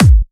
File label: Kick 138BPM, Drums & Perc; G#minor; Vengeance Samples; Manhattan Kit